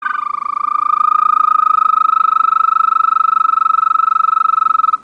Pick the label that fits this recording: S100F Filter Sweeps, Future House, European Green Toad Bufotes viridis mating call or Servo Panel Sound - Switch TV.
European Green Toad Bufotes viridis mating call